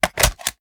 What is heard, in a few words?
sm uzulu reload xmag fast mag in; gmod; iw8